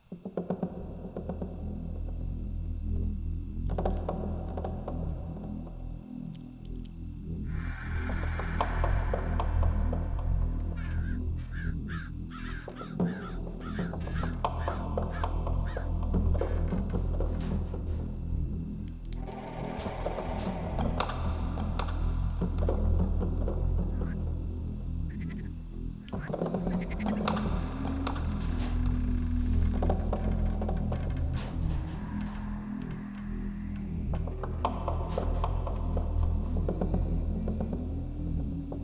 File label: ambiance